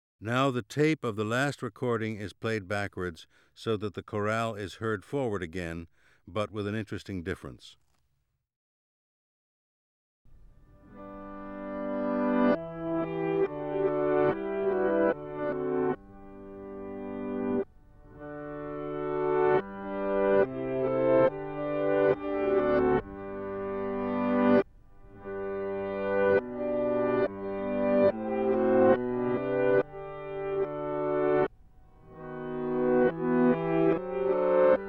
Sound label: Effect of tone envelope on timbre C; Umgekehrte Hüllkurve; Psychoakustische Grundlagen der Musik